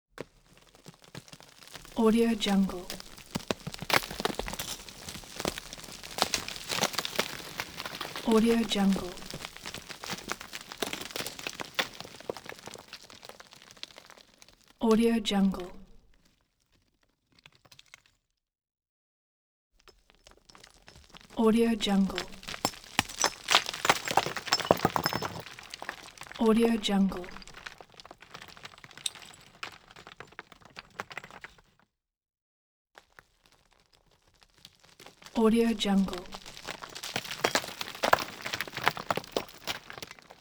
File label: dirt fall on gravel